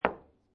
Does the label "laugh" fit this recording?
No